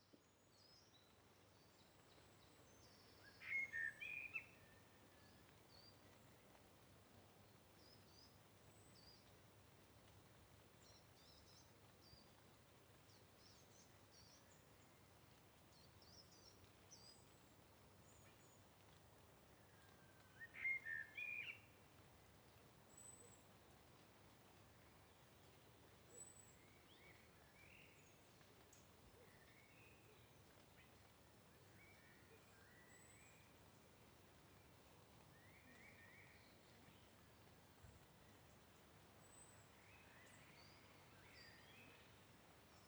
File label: woodland atmo in uk